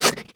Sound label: breath; Scrat